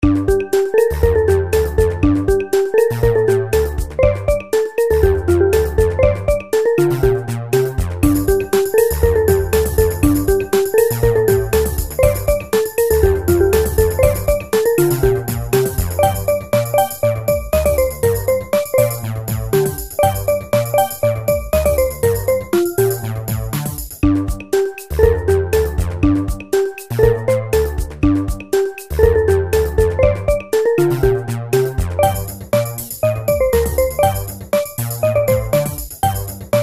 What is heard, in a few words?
folk tune